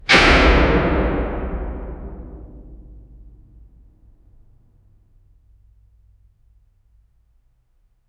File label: attic